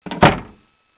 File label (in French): fermeture porte